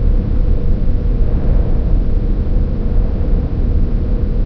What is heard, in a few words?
cargo hold, borealis ambient areas; hl2